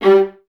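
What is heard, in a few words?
Violas G 3 Staccato; Miroslav Vitous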